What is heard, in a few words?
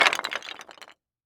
wood sticks drop